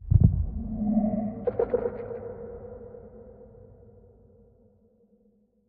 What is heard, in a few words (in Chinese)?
监守者接近警示声